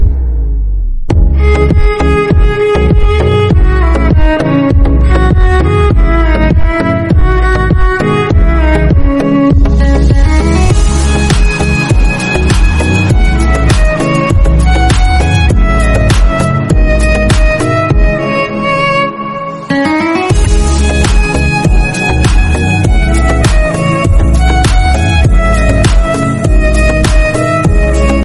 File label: sad ringtone